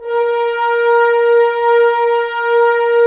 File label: Horns; Roland - LA Composer vol. 4